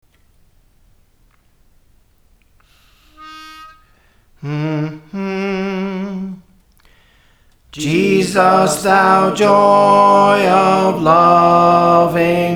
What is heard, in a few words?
Jesus Thou Joy of Loving Hearts, Soprano; Praise For The Lord Training